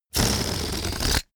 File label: balloon air release fart